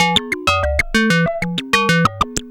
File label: (arp) serge; Sonic Foundry (Sony Creative Software); Ugly Remnants VOL-2